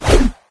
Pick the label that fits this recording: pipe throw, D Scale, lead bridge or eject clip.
pipe throw